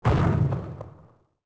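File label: ship to strand; shipcharge; Pirates Of The Caribbean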